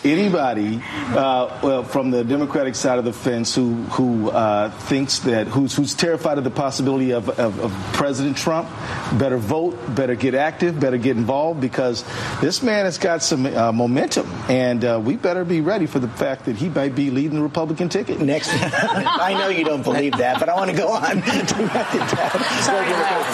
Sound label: laughing over trump; No Agenda